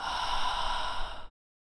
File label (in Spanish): Voz tenor air f